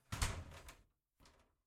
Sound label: Wardrobe Close; raw game audio files; Samo; MiSide